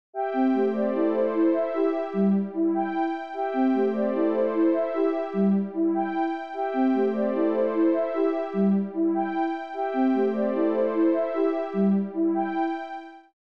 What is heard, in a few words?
beepbox song